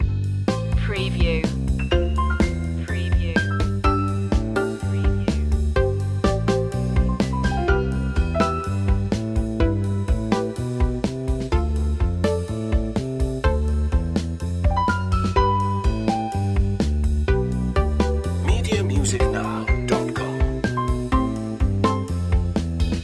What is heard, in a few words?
carefree day out loop